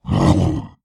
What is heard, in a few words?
kagouti attack; skywind